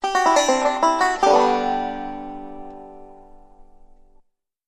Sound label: Banjo Lick